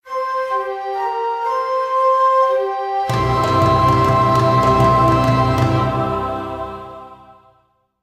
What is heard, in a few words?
Win Battle; heroes of might magic v gamerip 2006; Sylvan